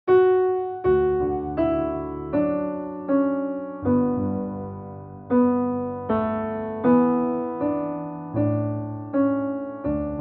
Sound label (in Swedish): Den blomstertid nu kommer sang piano